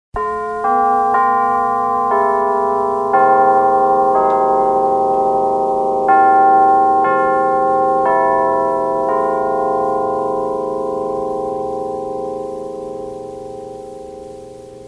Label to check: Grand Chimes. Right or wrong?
Right